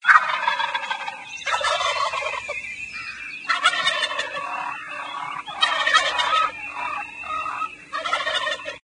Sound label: Turkey Gobble; SoundBible